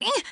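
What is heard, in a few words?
moan, female; Interactions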